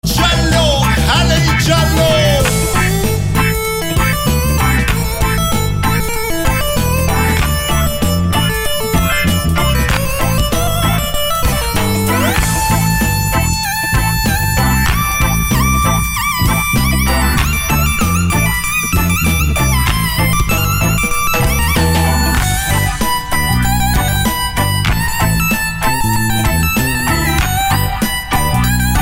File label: armenian reggae